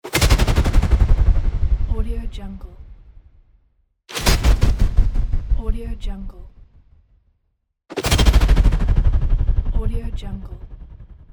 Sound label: pulsing impact; transitions and movement